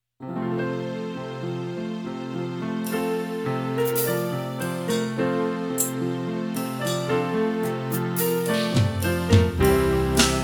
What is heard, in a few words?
inst